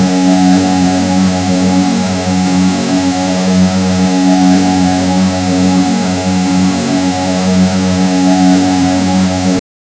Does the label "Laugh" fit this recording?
No